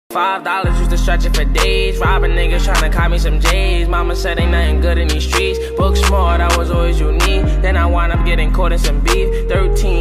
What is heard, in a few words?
donation alert music